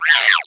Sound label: egg pain; player; Portal 2; eggbot